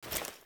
Female Foley Action Default Melee Swipe, Default Clothing; Characters; Player